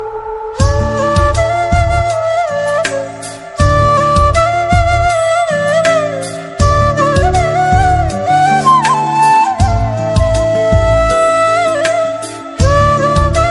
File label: Heart Touching Flute Instrumental